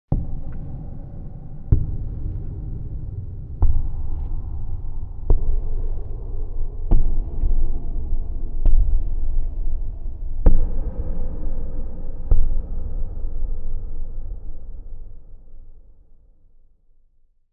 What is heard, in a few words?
Footsteps Wood Reverb; Inquisitor 2